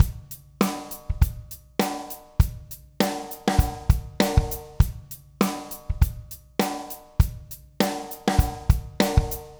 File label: 100 Pop Drums; Pop Rock; Moderate Tempo; Pro Tools Essential Loops; Digidesign